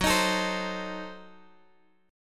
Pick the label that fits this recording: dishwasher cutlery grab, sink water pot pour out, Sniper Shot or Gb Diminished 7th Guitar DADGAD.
Gb Diminished 7th Guitar DADGAD